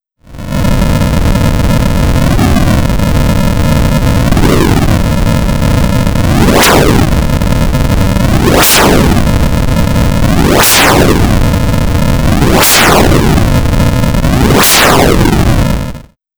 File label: colorful noise; enduro